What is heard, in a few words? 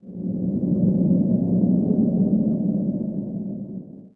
wind LOW